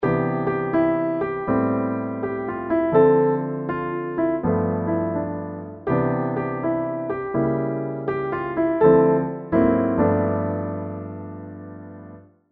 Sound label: Piano